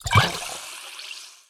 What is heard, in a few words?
Potion Underlay Attack